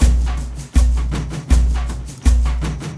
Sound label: AF TRANCE; Spectrasonics; Heart of Africa 2